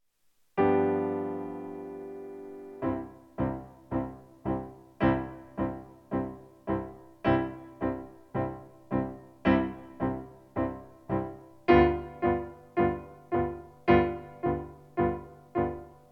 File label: PIANO accomp; Tarantara Go Ye Heroes